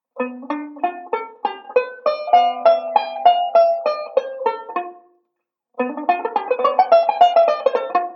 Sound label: ii V Pattern, banjo